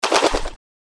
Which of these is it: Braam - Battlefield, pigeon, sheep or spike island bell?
pigeon